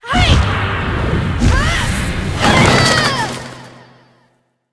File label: vampire attack, monster; rigel